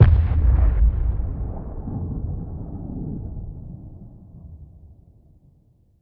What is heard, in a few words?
dragon death explosion